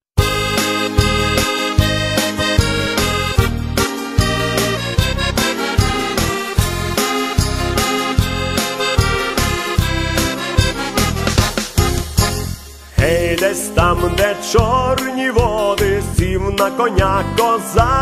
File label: Ukrainian Folk; Gei, sokoli!